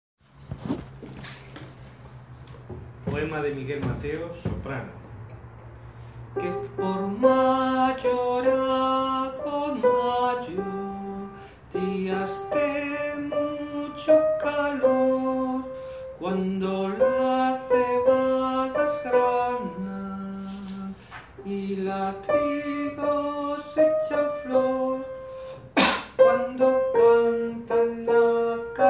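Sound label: soprano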